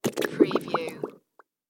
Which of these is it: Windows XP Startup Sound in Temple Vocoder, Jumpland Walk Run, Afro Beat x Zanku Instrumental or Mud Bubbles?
Mud Bubbles